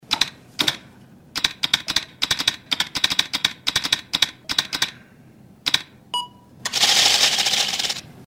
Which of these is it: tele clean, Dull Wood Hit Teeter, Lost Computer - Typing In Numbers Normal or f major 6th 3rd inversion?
Lost Computer - Typing In Numbers Normal